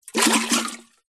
liquid water in metal canister short movement splosh splash